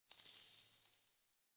Idle Head Shake; Tagfer

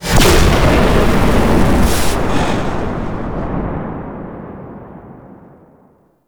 Void Dragon Firebreath Cast; Enemies; Abilities; Warframe; Duviri

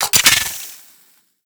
Tommy Gun Reload; Warframe; Tenno

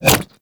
Infested Throwing Star Adhere; Warframe; Infested Throwing Stars

